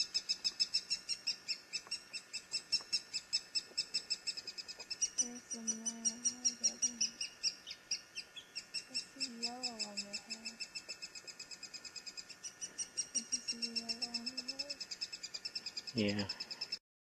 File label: woodpeckers; Hi8